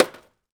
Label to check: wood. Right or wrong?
Right